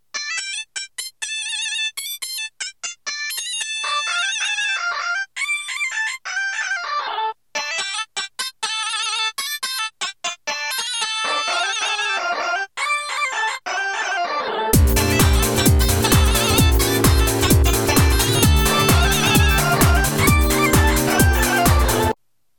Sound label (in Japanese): 太いサウンドと効果的なリバーブ; ニコニコ動画